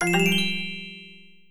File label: player current turn